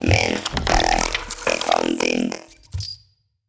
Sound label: lithoid portrait machine; Stellaris; lithoids; portraits